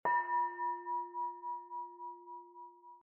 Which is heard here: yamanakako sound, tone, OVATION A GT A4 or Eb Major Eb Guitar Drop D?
tone